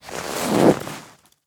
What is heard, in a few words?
snow gather